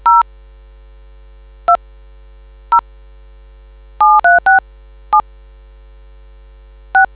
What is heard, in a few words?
dtmf